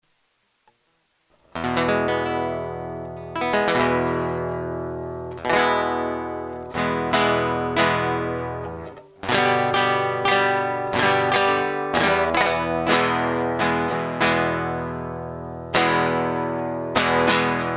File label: Bulldog Blues; age of youth